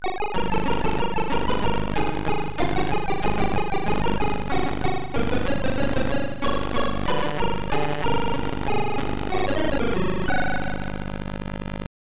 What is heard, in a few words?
Level Complete, C64 Musics; Bomb Jack; StArkos